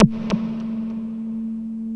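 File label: wall moog, bass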